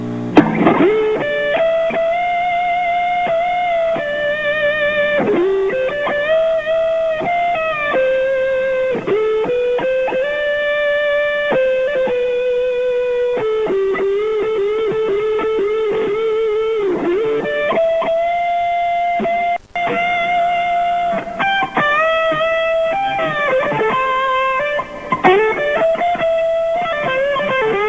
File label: guitar